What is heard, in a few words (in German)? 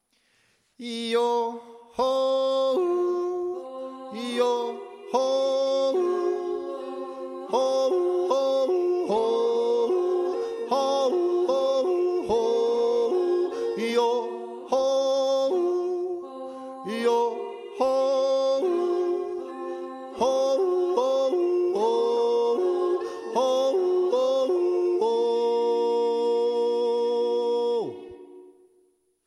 3 stimme, jodler